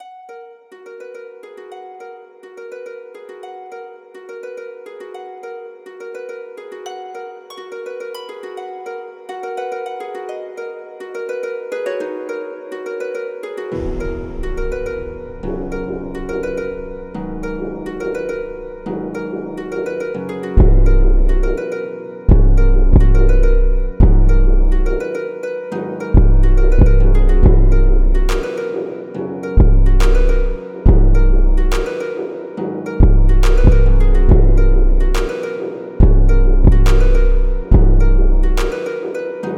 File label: Drain 140, emobeat